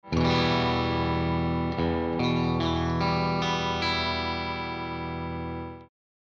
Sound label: Single Coil Bridge, stratocaster loaded pickguard